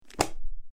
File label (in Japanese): 本をめくる音